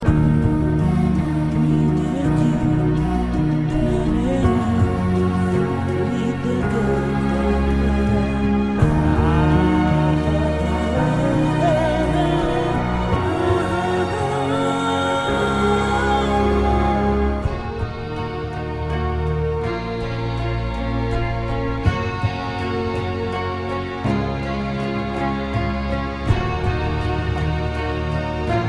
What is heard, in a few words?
african music